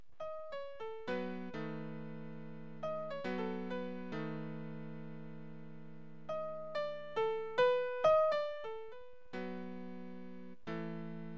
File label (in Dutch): stukje voor piano